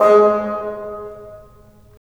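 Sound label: wind bassoon; LA Composer vol. 3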